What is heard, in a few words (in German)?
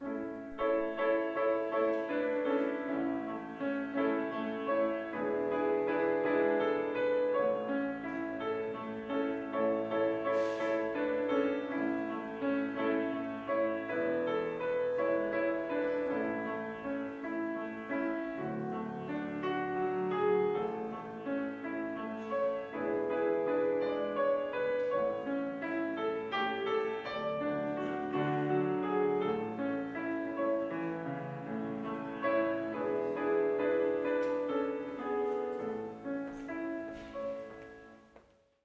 Vorspiel